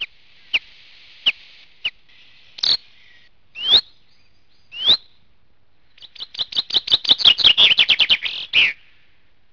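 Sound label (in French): pinson arbres, chants d'oiseaux; sons nature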